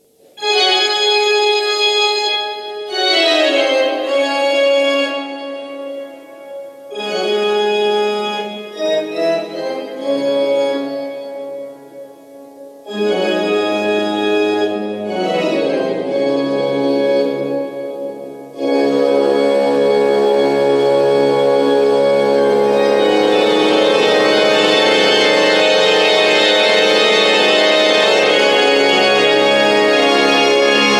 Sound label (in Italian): Toccata Re minore; Bach